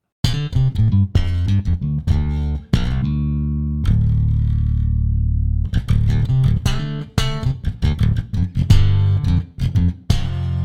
Trilian A Bass

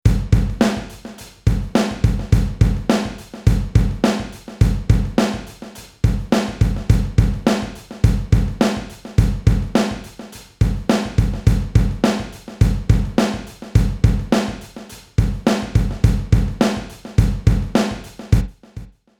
Drums Rock

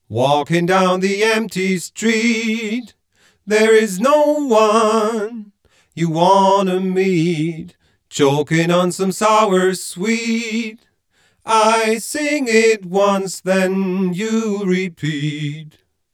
Echo Fix EF X3 Vocal EF X3 Chorus

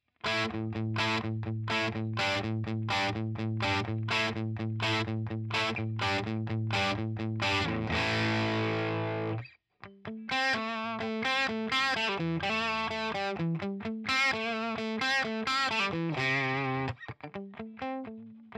Boss Nextone 6L6 DIST

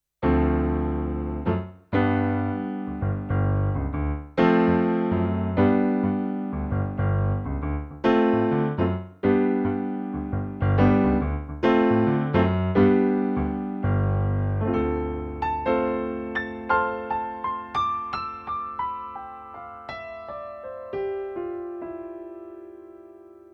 S51 Piano

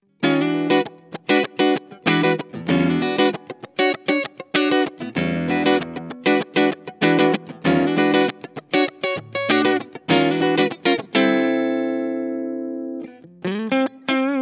Schecter C 1 Exotic Neck Pickup Split Clean

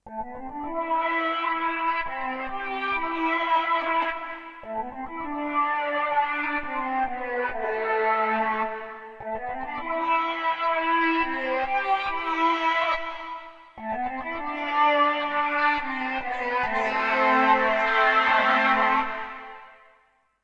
Iridium Core Wheel Talktron Quantum

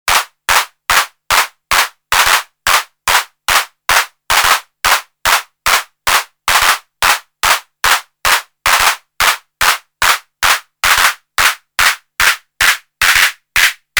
Synth Clap; ADS-7

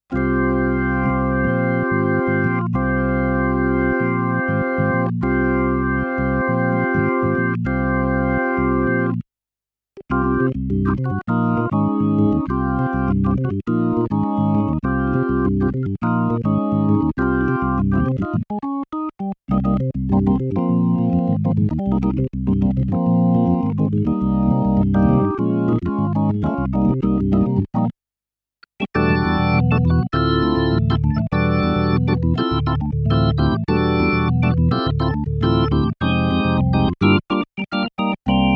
B3 Impro

